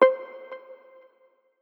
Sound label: notification; Fennix; Dummy audio